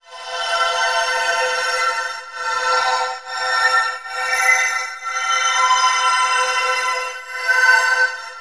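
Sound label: ambient drone; Zero G; Malice In Wonderland; AMBI DRONES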